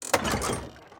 plink ball launch; ui; economy

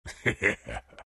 bearform laugh; dota; lone druid

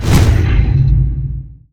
throw; weapons; dota; creep

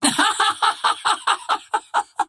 laugh; Vengeful Spirit

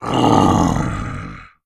death mount; physics; deaths; specials; chen

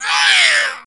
death; creep; greevil

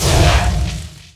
attack; dota; dazzle

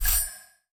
topmenu select; ui; dota

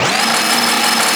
hookshot retract; weapons; dota; rattletrap

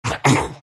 big pain; Undying

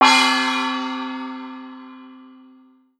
gong

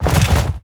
inv sort; ui; inventory

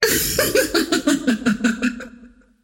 laugh; queenofpain

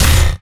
attack impact; weapons; dota; shredder

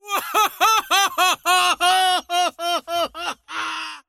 wheel laugh; dota; largo

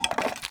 bucket foley; movement; tusk

